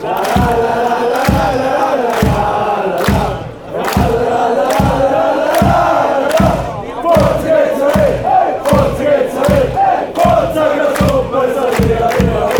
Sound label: lalala forza gc allez, football song; grasshoppers